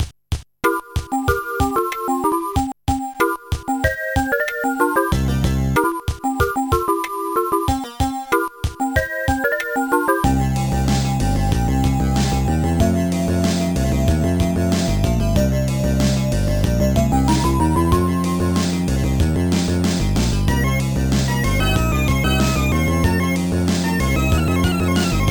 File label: jazz jam; Cybarite; Trackers; Modland